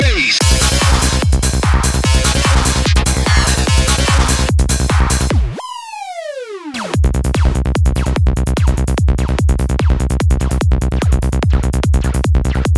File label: Psy Trance